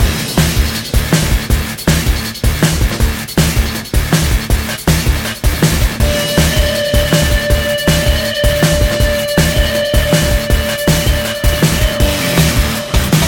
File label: heavy industrial metal, музыка для подложки